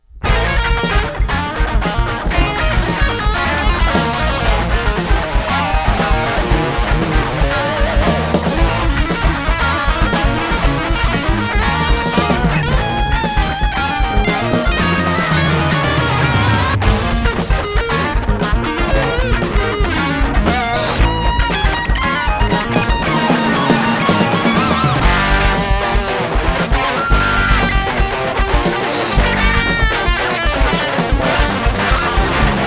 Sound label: Funk Jam